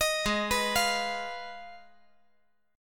Ab Minor 6th, Ab; Abm6 arpeggiated; JGuitar

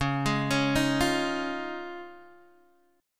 Db Major Add 9th, chord, Guitar DADGAD; arpeggiated; JGuitar

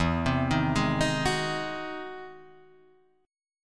E Augmented Major 9th; arpeggiated; E+M9 chord; JGuitar

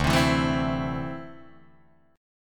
D 7th Suspended 2nd down Guitar Open D

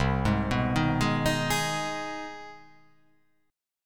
C Minor Major 7th Sharp 5th C; CmM7#5 arpeggiated; JGuitar

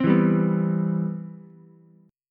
C Major 7th, C, down, Ukulele; strummed; CM7

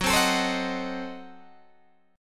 Gb Diminished 7th Guitar Open G

